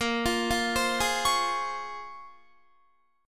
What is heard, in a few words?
Bb Minor 9th Bb, Guitar; Bbm9 arpeggiated